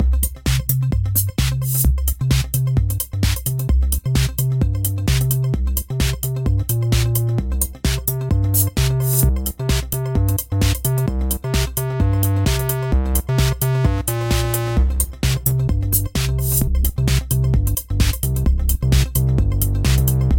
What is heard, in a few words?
bass test